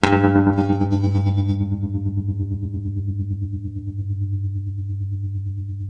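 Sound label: tremolo G 1, guitar; East West; Steve Stevens Guitar Samples Collection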